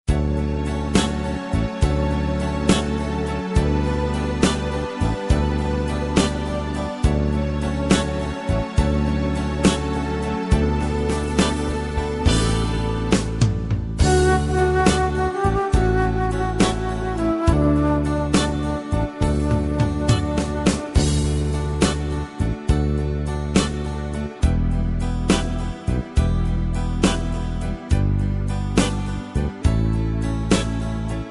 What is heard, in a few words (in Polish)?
kogo Jezus instr